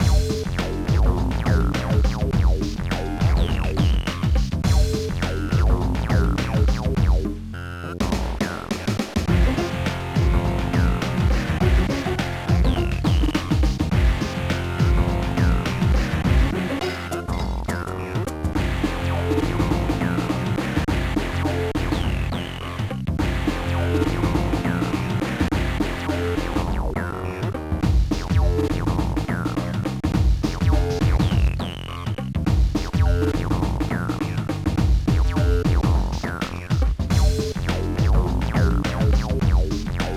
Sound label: Hacking